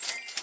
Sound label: MOVE; C64 Dreams; Multi-Sound Default